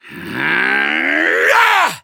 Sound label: battle ring; dqxi